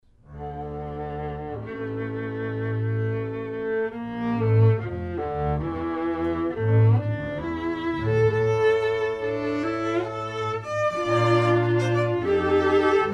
sextet; klengel

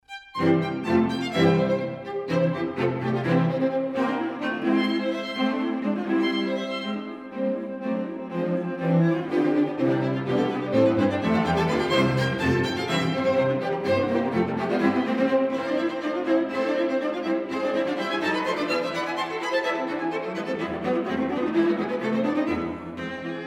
sextet; gliere

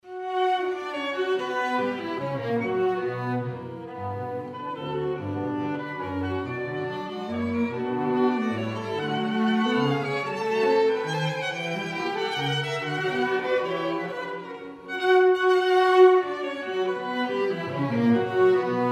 waltz; kiel

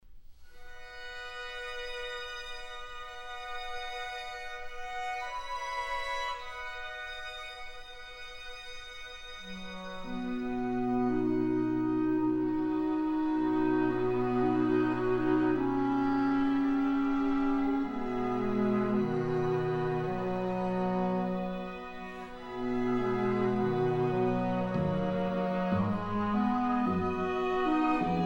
quintet; Coleridge-Taylor; 2nd Movt

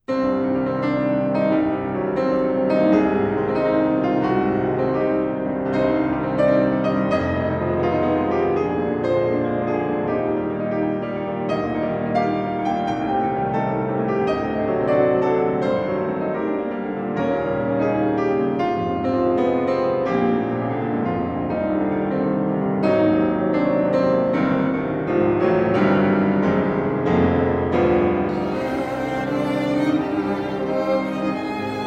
piano trio; jadassohn

